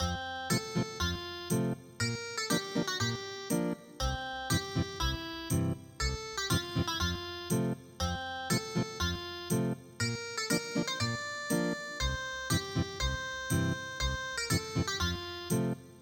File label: Ethnic Guitar